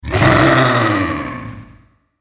monster

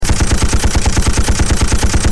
gun; jiqiang

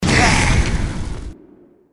rush

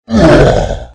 monster hurt

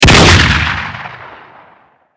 gun

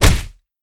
beat heavy Punch

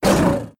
howl little beats

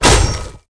beat, music sound; jian

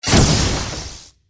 rush; cike

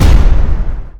bomb

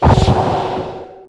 beat; shengguangji

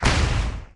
hit; mofa

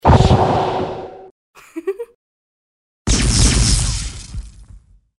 shout; huanying

